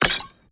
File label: potion up; skyrim